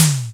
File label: high floor tom drum single hit d sharp key, Royality free tom sound tuned to the D# note.